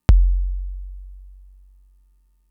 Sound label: kick, Fricke MFB-522